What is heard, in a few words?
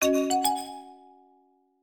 Join Sound Effect; Chillzone